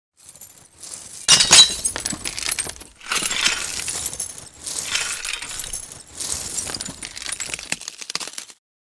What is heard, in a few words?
Small glass debris shards movement